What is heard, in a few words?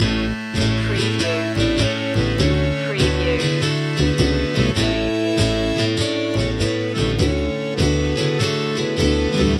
circus loop